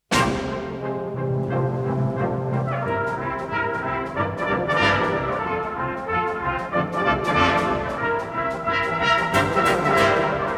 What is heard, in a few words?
Polks; Thunder And Lightning Polks